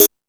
High Hat, Drum Machines E-MU Pro-Cussion

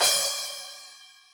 crash, Millenium MPS-400

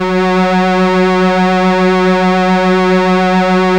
SIEL, String Machines

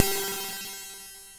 cymb, cymbals

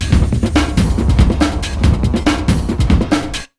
RUN 140, Big Beat Drumloops

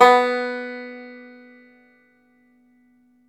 FLATTOP, Flat Top Banjo

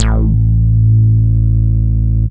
moog A; Techno Trance; Techno Trance Essentials

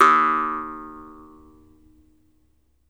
jaw harp; E-MU Producer Series Vol. 7 – Old World Instruments

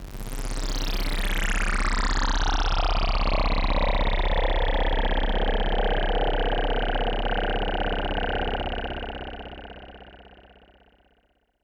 Pad C, Synth Prophet One Shots; Electronisounds

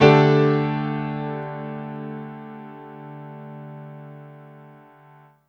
Piano stack (D) sus; Sonic Foundry (Sony Creative Software); Ugly Remnants VOL-2

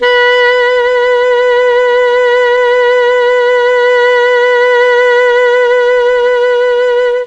B2 ALTO SAX, Mellotron; Mike Pinder - Mellotron Archive CD; CHMB ALTOSAX

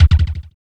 base drum; DRUMS

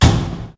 TRASH, ELEC.DRUMS; Crypton - DrumBase5000

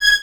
Violins G#6; Miroslav Vitous; String Ensembles; Detache

